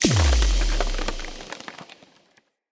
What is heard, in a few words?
Thorn Bow Stone Arrow Impact Enemy Full Charge; Weapon Impacts; Envoy Fey Bow